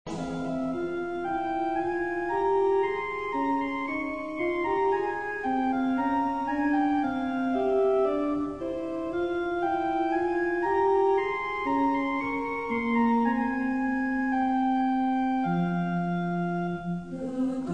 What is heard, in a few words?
Orchestra and Choir, Hymns